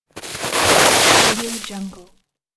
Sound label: snow downhill slide short